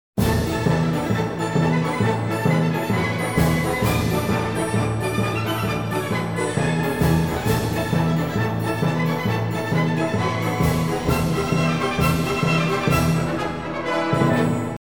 estribillo; forma rondo

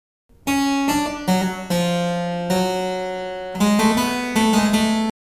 sujeto; fuga

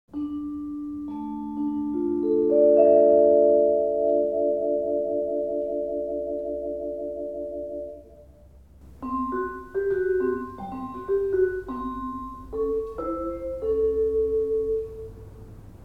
Sonido de vibrafono; Instrumentos de percusion; Percusion determinada; laminas

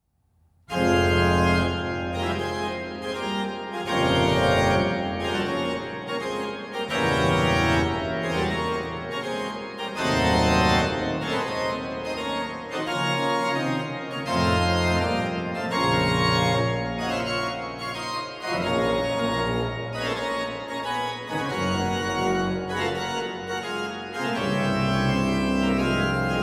Sonido de organo; Instrumentos de teclado

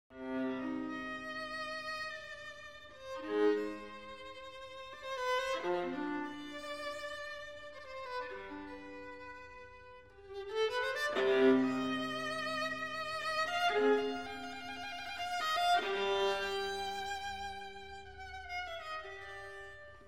Sonido de viola; Instrumentos de cuerda; Cuerda frotada

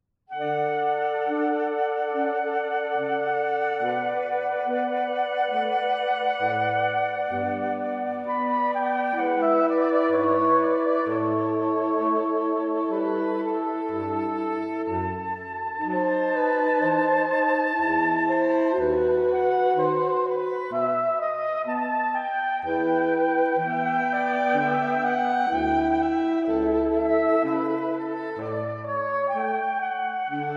Cuarteto de saxofones; Agrupaciones de camara

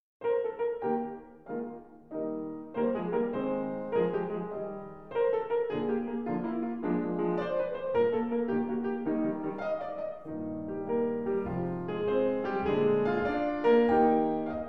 Trio con piano; Agrupaciones de camara; Agrupaciones de cuerda

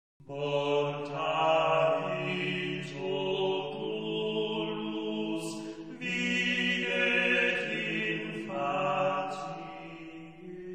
Organum paralelo, secuencia de doble cursus; de Rex caeli; Edad Media

